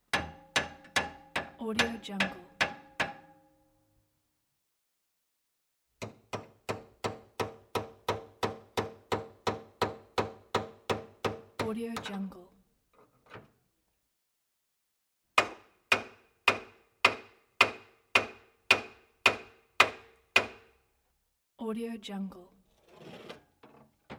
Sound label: hammering metal at construction site